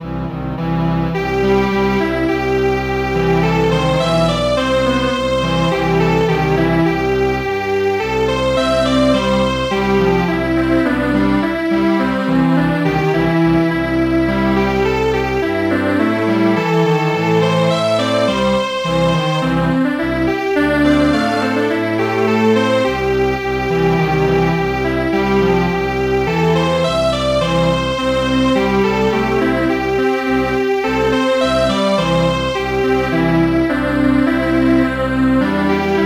Strings Quartet; Candle Dance

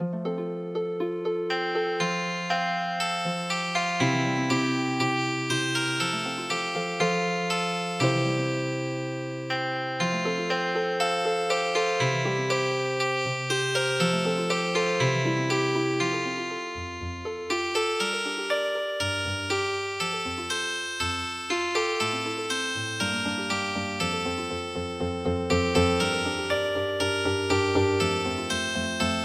Healing Harp; Happy Time Has Come

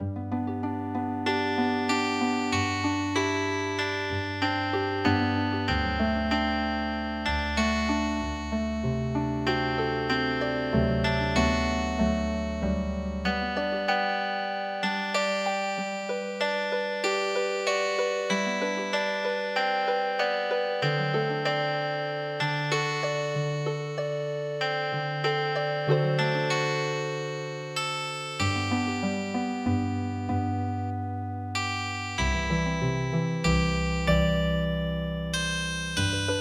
Healing Harp; four funny smith

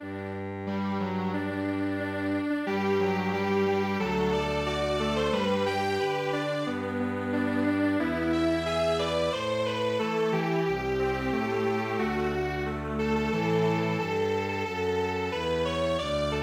Strings Quartet; Columbia Gem Of Ocean

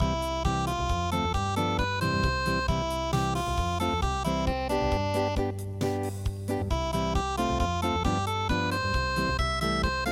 Humpty Dumpty unpluged

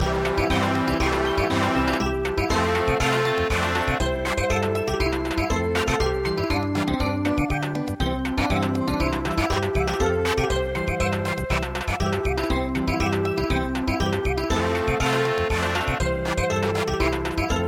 samba; good bye

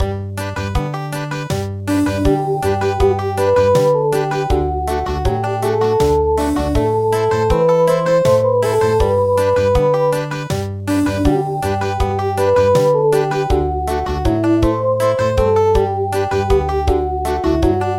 Country

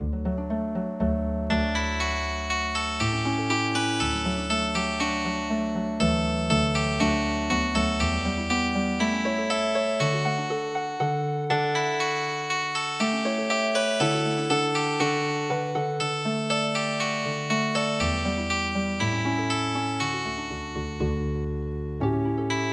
Healing Harp; Muss i denn

